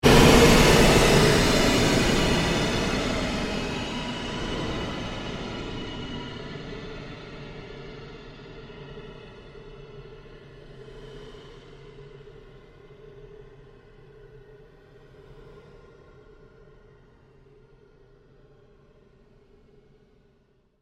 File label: Created Pulse Long Fade, Rise And Dive Sound Effects; Sound effects created by manipulating other sounds; Rises and Dives